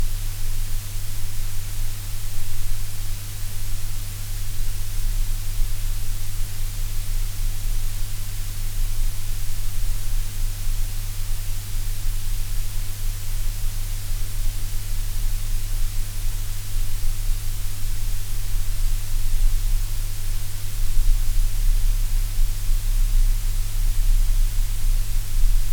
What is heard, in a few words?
Technics Transformer Noise